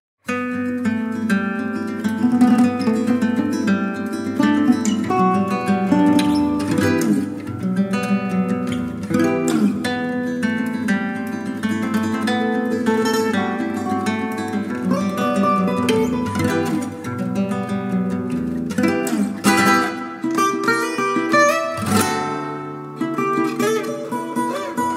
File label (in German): Musikbeispiel